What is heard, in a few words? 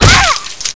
headshot